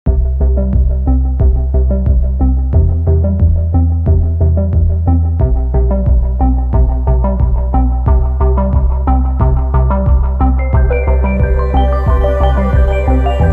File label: Menu Music; Citizens of Earth